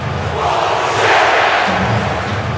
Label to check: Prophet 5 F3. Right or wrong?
Wrong